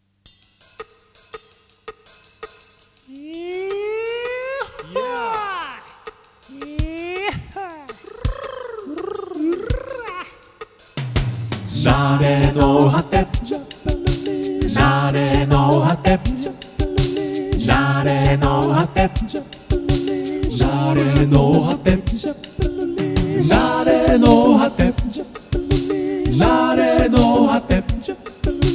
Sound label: Jingle (Bossa Nova 2); FM NARENOHATE Jingle (Bossa Nova 2) -2024 Mix- (Mono)